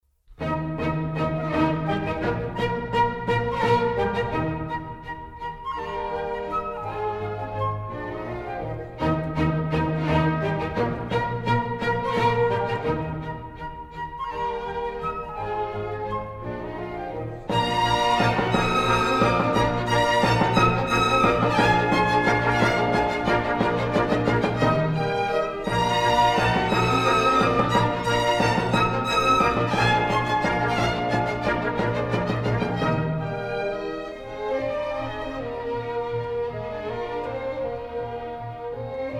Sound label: German Dances, Eb Major; Mozart; Dances and Marches